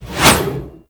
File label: Reaper Scythe Whoosh